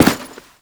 Step Default; Warframe; The New War; Characters; Veso

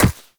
walk ice, footsteps; Warframe; Characters; Operator

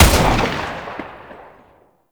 Shot; Lato Remaster; Weapons

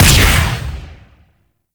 Pistol Charged Shot; Operators; Drifter

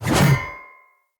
Two Handed Mace Whoosh D; Weapons; Enemy; Blunt; Sinecure Two Handed Mace

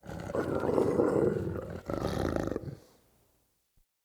Wolf Growl Enemy Spotted; Animals; Perception

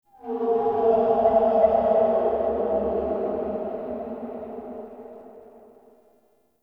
Unstable Texture; Ambience; Warframe; Shadowgrapher

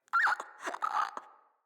Infested Crawler Breath, enemies; Crawlers

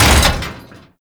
Gatling Gun Dry Fire; Warframe; Tau Gatling Gun

